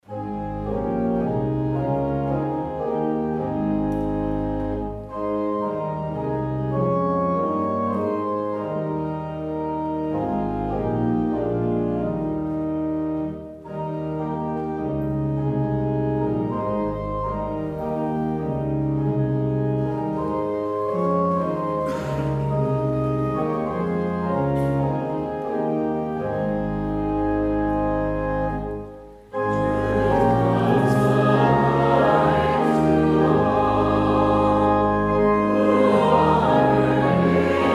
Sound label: hymn